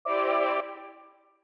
Small Shock

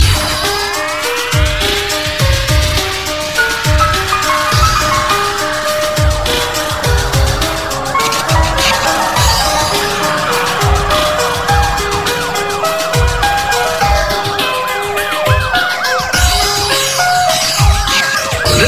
Frenchcore

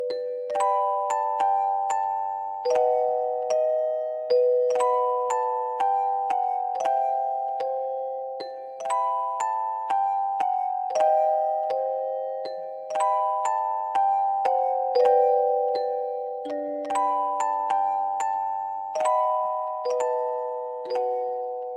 theme musicbox

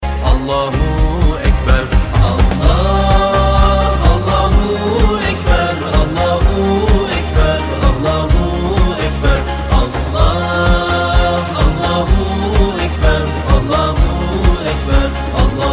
ALlah Akber Islamic

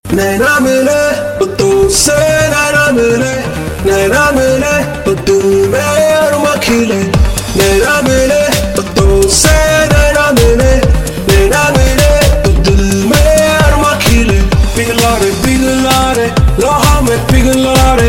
Robot

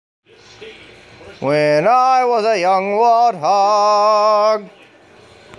Warthog

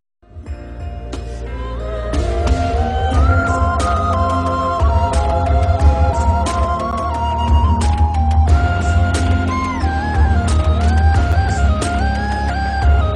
inspiring; Airtel

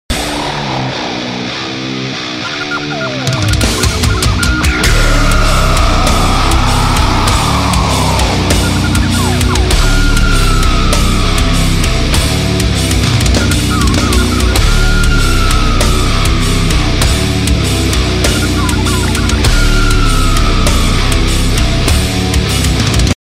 Drum Solo

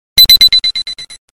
Sms Tone

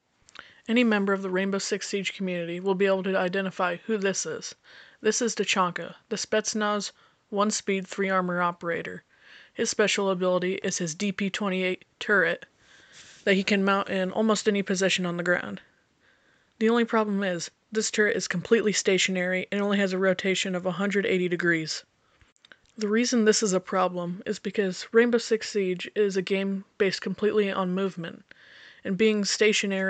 wub

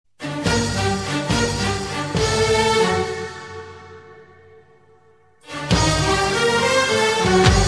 New Sms

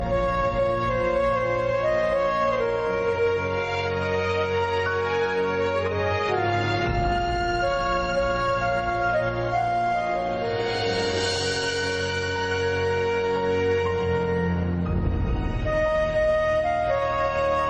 Maan Geet Instrument

